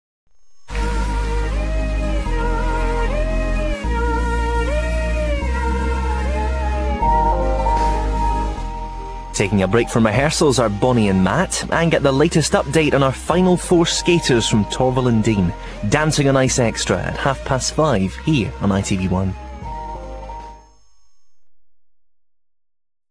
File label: jingle; itv1